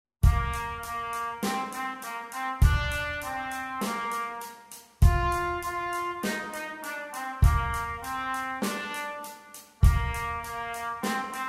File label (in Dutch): Rock Trompet